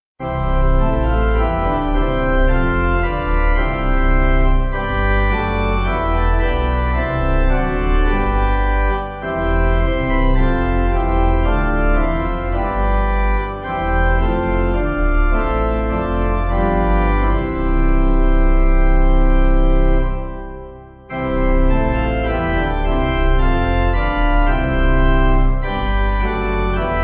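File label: Spread The Reign Gott Sei Dank Pipe, Pipe Organ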